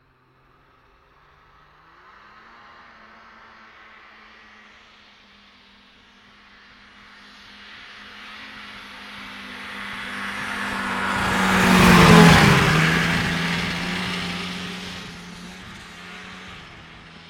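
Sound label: Snowmobile 550cc Engine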